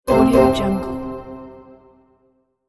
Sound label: orchestral notification sound